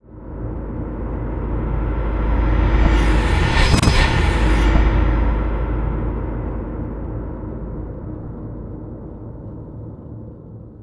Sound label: bash fwd rvrs, Cinematic Metal Impacts